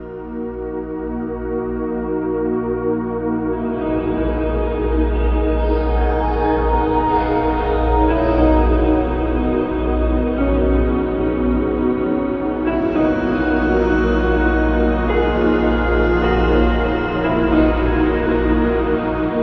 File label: moonrise instrumental